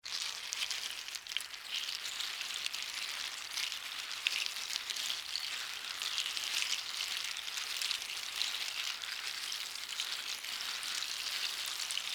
Debris Mixed Nuts, Pile; Texture; BlastWave

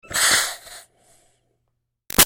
Steam Release Iron Chuff Hard; Household; Miscellaneous Household; Big Room Sound

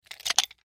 Nunchaku Pick Up; Miscellaneous Combat; Warfare; Blastwave

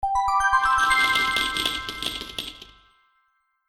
Bonus Gold Coins, video game

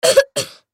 Human Cough; Cough Male Six Yrs Boy; Human Cough Male Six Year Old Boy; Sick; Sound Effects:Human

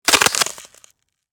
Impact Ice Sheet, Crack; Cracking; Objects; BlastWave

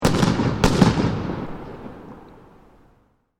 2 x shots of high velocity gunfire; Guns; Gunshots

